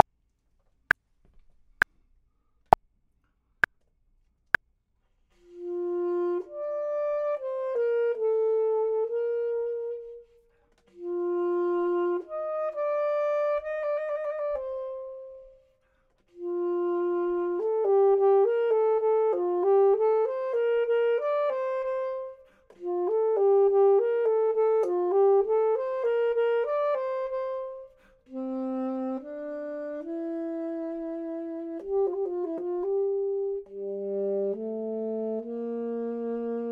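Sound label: top, Saxophone and Clarinet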